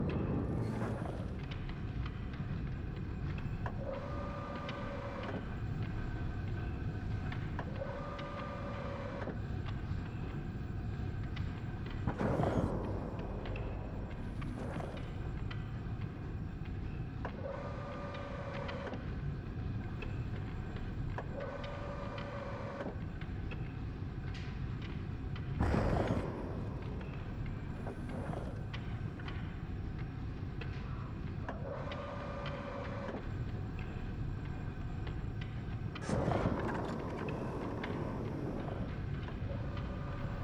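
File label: ambience lava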